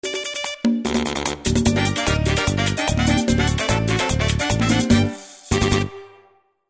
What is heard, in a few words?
music only; Latin